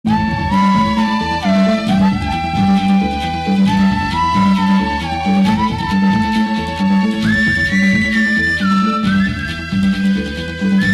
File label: Ring Tone